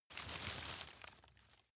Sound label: grab rustle medium